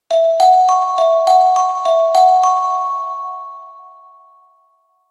Twinkle Chime, bell chimes; Mudita Pure

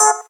gayageum, keytone; SKY IM-A800S-VEGA-LTE

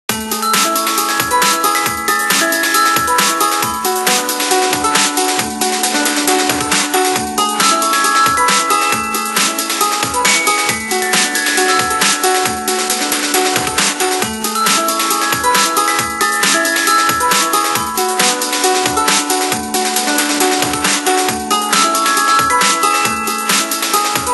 Ring Play

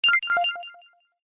Clear Tone; Samsung Z2; Alerts

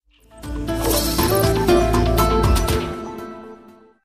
Power On Tone; Verykool; S700

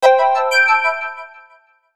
Alert; PlusFourSix; Patti; Lars Edvall

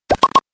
sleep, Samsung SGH-i600

